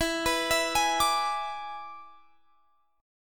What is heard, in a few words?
E 7th E down Guitar Drop D